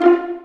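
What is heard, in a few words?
Vio pizzicato F3; Digital Sound Factory Virtuoso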